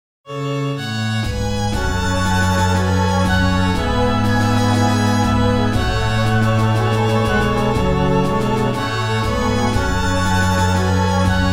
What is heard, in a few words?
epic logo